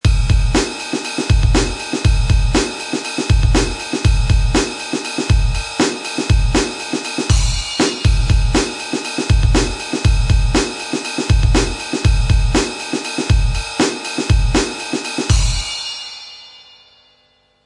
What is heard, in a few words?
amen break 120bpm